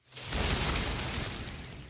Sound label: Satyr Conf Spell